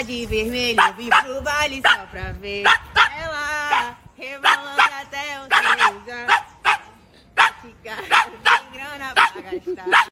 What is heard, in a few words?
guy barking; audio meme